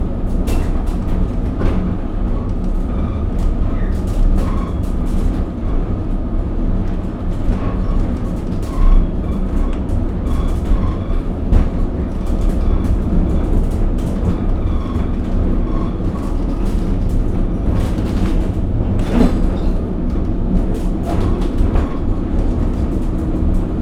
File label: funicular menet közben